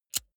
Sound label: lighter wheel spin soft